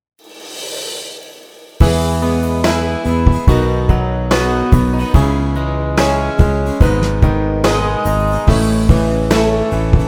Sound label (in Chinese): 摇摆流行摇滚 Pop Rock Swing; cubase 编曲助手